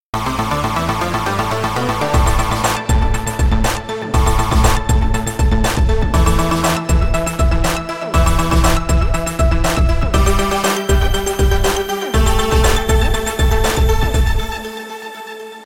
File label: ringtones; Ziox Zi4004